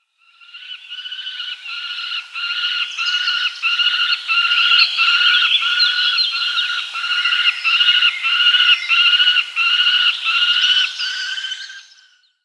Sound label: Peregrine Falcon nestling